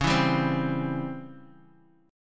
C sharp Minor Major 7th Flat 5th Guitar; C#mM7b5 strummed; 8,7,7,5,x,x; JGuitar

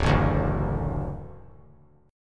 D Major D down, D Chord; D strummed; JGuitar

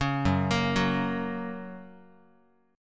Gb Major, Guitar; Gb arpeggiated; JGuitar

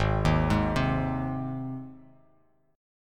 Ab Major Ab down; arpeggiated; JGuitar

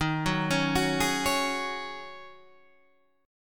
Ab 7th Suspended 4th Eb

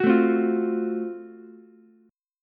Gb Minor Major 7th Flat 5th, Ukulele; strummed

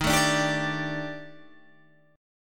D sharp Major 7th Flat 5th, down, Guitar, Open G

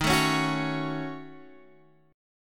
Eb Minor 7th Flat 5th, down, Guitar, Open F; strummed

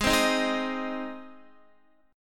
Ab Major Ab down Guitar; Ab strummed

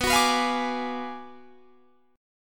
B Minor 6th Sharp 11th B

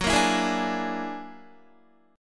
Gb Augmented 9th Gb down Guitar 4F,4A,5C,5G,5C,6E; Listen to Gb+9 strummed

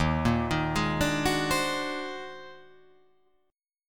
Eb Minor Major 13th; EbmM13; arpeggiated; JGuitar

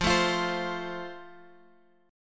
F Major, Guitar Open F; F strummed

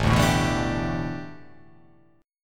A 9th Suspended 4th, A9sus4 chord; strummed; JGuitar

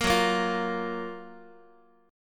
F sharp Major 7th, Guitar; F#M7 Chord; strummed; x,x,1,4,2,4

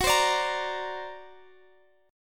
D Augmented 7th, chord

